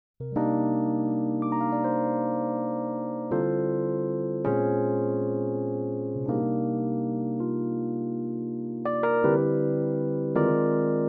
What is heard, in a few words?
Rhodes Pan